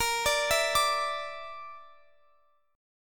A sharp Major Flat 5th, Guitar, Open F; arpeggiated; JGuitar

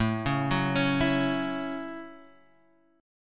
A 6th, Guitar, Half Step Down

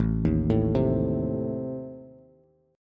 A Diminished A down Bass; Adim arpeggiated; JGuitar

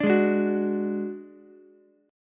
D Major Flat 5th D Ukulele, chord; strummed; JGuitar

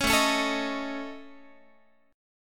A Major Flat 5th A Guitar, chord; AMb5; strummed

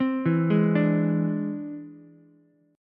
E Augmented 7th E down Ukulele

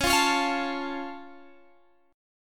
C sharp Augmented down, guitar chord; strummed

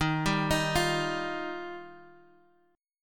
Eb Major Add 9th, Guitar Open F; 15,14,15,12,x,x; arpeggiated

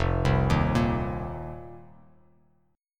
F 7th Suspended 4th F down; arpeggiated; JGuitar

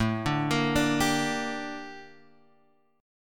G Minor A, chord; Gm/A; arpeggiated; JGuitar

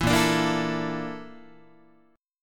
A sharp Major 7th Flat 5th A sharp Guitar; strummed

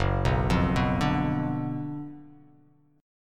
F sharp Major 7th Suspended 4th Sharp 5th F sharp

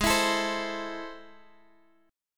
A Minor, G sharp; Am/G#; strummed; chordshape

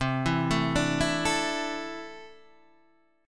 C 6th Add 9th C down Guitar DADGAD

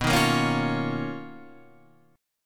B Major Sharp 11th; strummed

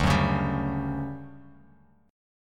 D Major 11th D; strummed; JGuitar

